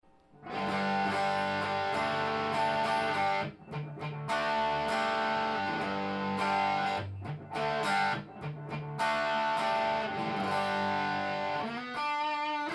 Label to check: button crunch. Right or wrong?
Wrong